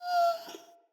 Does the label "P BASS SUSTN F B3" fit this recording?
No